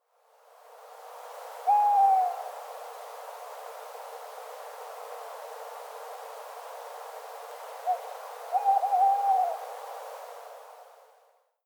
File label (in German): waldkauz; ratingen